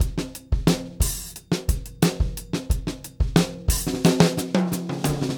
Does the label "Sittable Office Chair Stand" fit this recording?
No